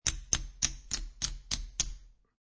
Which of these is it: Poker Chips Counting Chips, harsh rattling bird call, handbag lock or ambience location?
Poker Chips Counting Chips